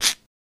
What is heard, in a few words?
Toast Scratch Single, cooking food